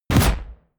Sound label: sci-fi musket fire zing; schusswaffen